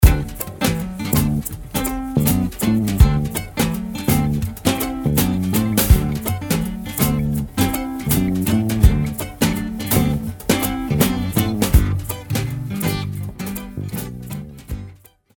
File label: acoustic blues